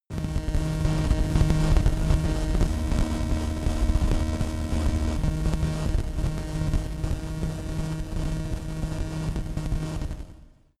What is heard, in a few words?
static crackle noise long, sciene fiction